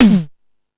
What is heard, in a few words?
Hip Hop, Drum Machines